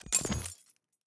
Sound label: light cyber money